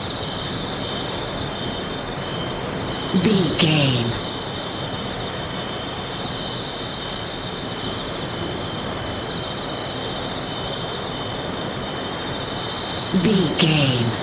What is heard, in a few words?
crickets chirping night ambience loop